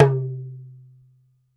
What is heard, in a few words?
Talking Drum tone; E-MU Producer Series Vol. 7 – Old World Instruments